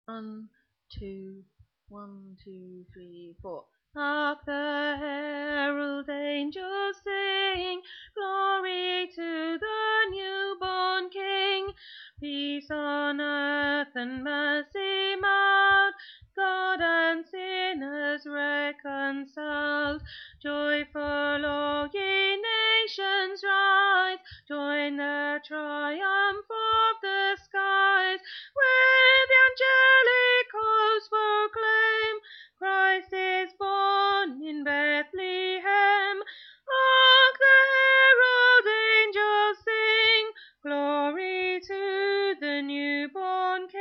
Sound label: Alto